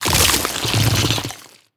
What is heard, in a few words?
bite digest, barnacle creatures; Half Life Alyx